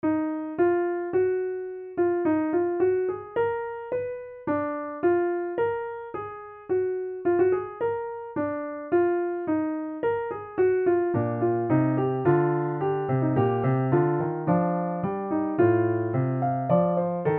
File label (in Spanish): Doble Fuga en Mi bemol menor; some little fugues